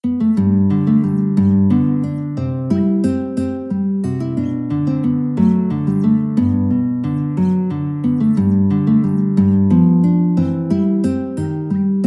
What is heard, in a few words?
guitar